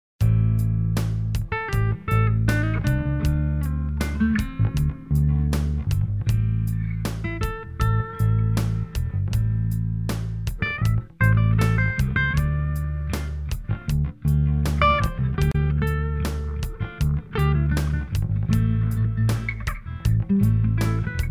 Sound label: minor blues